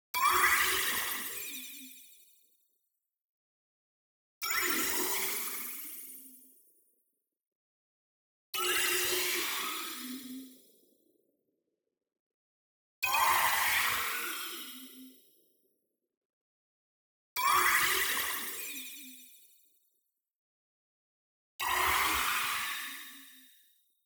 tonal, fizzy squelch; boom library; Anime Essentials

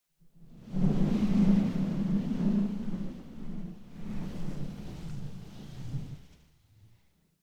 Wind Gust Medium Whoosh Cold Blizzard; Hollywood Edge; sound designer's toolkit v2

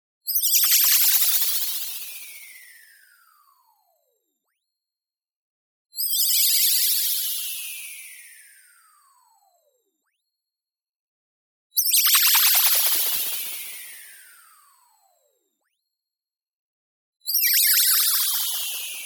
Light Magic Downer; STINGER; boom library; Anime Essentials; DSGNMisc

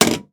Briefcase Latch; Office Supplies; 1001 Sound Effects

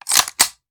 Machine Gun Foley; Sound Ideas; Guns

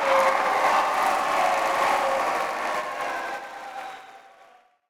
Crowd Cheering; Pitch Black Audio Pong

